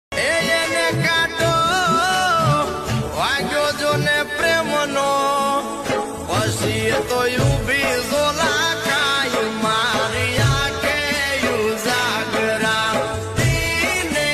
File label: gujarati song ringtone